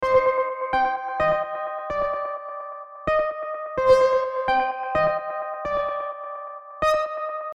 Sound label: 128 Cm Synth